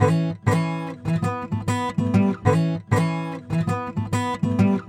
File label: Traditional Guitar 098bpm A, African Guitars; Quazibeat - African Secret